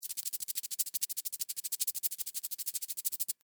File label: Beetle Run Sand, Beetle, Run, Sand, Scurry, Insect, Bug; Beetles; Sound Effects:Animals:Insects; Blastwave